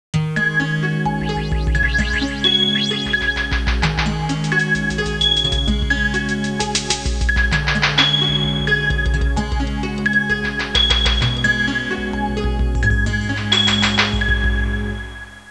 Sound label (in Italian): musica elettronica